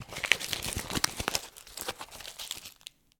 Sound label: crushing empty cigarette box; 3maze; Trash